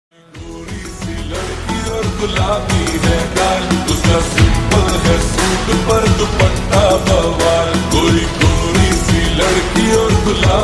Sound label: Slowed Reverb Ringtone